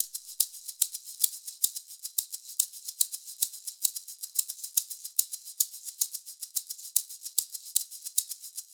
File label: maracas loop, 110; perc construction loops; SEISMIC Drum Sample Pack; Slate